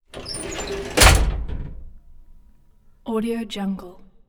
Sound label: mechanism accordion door slam lever; soundmorph